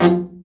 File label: BRA Bari, SAX; Best Service Gigapack 1; BR SAX 2